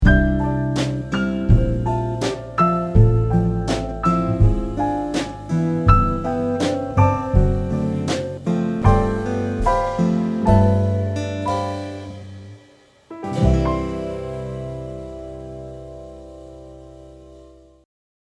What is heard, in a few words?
Chillout